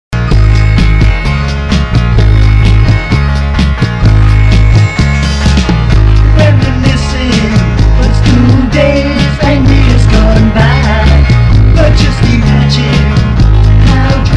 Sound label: 1990s indie